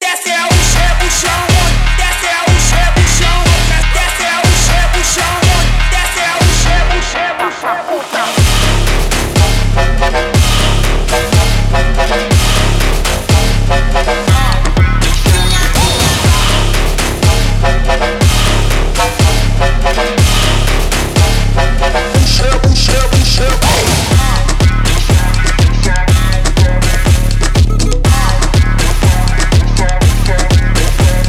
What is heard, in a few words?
brazilian phonk